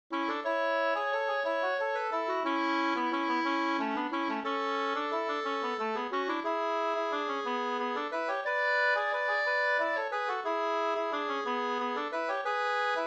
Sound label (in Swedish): vals